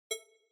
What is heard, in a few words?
click Button